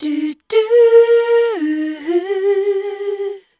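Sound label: MEL D sharp; Best Service; Voice Spectral II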